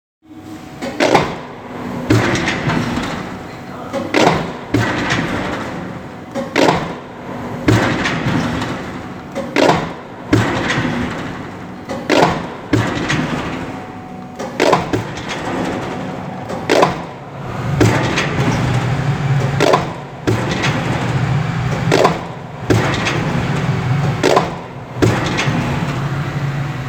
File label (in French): Cisaille guillotine